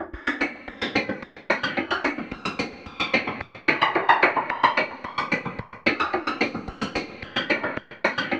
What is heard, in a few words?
SOLID WN BEATS; Zero G; Malice In Wonderland